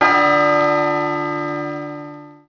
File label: Church bell; ambient; Project Gotham Racing